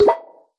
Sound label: stamp